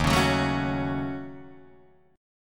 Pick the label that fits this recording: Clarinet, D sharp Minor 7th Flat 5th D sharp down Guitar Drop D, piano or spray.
D sharp Minor 7th Flat 5th D sharp down Guitar Drop D